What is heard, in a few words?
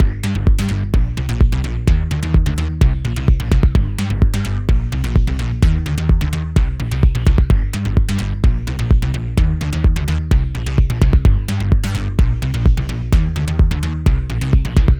Phat Bass Line Kick Gm 128 Bpm; Axel Wayn